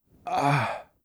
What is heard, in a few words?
groan; Singles Flirt Up Your Life